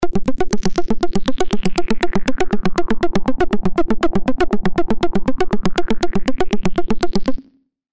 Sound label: percussive swoosh